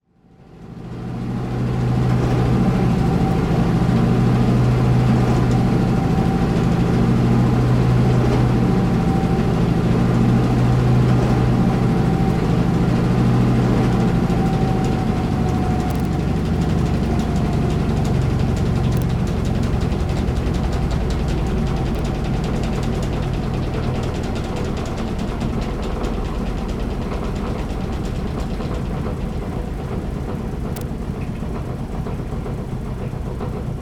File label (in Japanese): sentakuki; Chino Cultural Complex